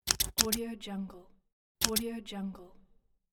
scissors

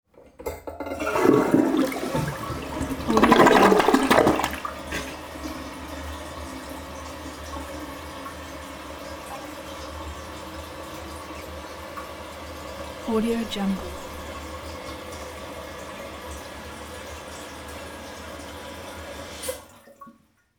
toilet flush water flowing

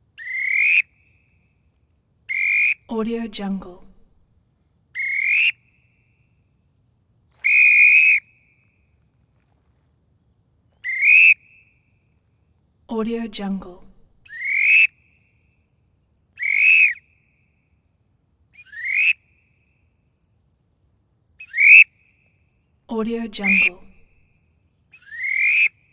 soccer whistle acme thunderer exterior restart; prosoundfx

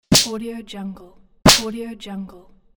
slaps in the face

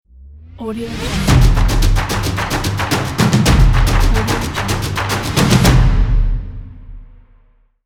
drums intro; sfx-for-you

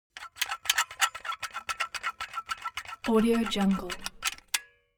object tool silicone gun empty pump fast

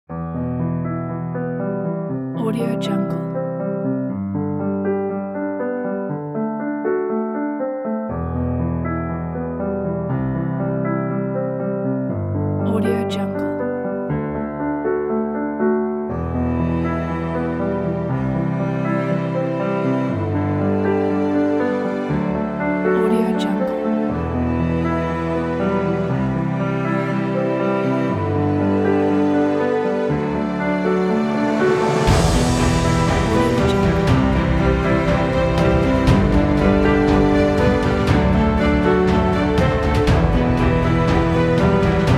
emotional cinematic trailer

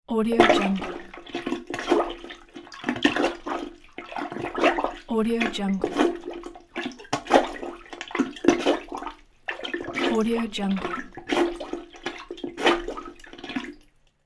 toilet plunger continuous plunging; sound ideas

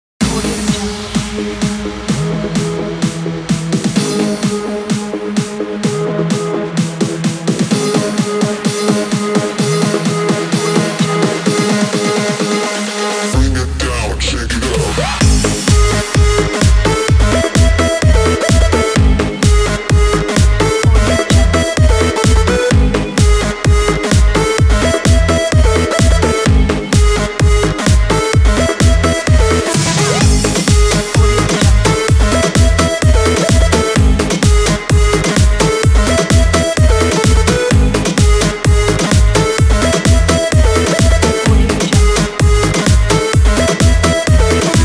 an edm; upnoise

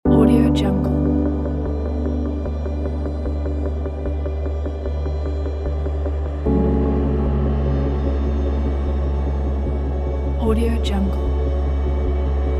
mystical dream forest

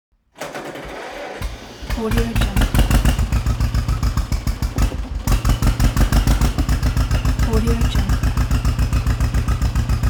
tractor engine start up idle shut down

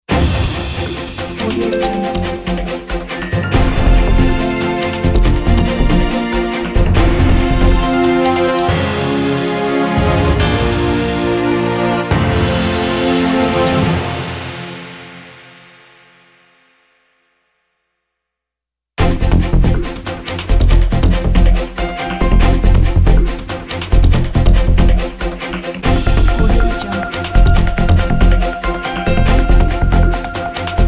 news design ident

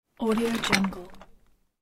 small toolbox movement; vintervarg

